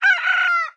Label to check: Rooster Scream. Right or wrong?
Right